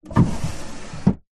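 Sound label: Dresser Drawer Shut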